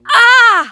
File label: pain